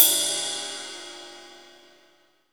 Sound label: MIX RIDE, MONO RIDE CYMBAL